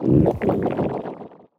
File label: growl medium; fistula